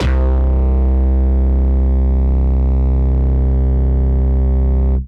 Synth Prophet Bass C; Electronisounds

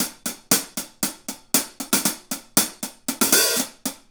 Hi Hat Pattern; Percussion; Essential Percussion; Hi Hat Patterns

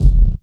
bass drum, 150 BPM; Ueberschall; Drum & Bass Resonance

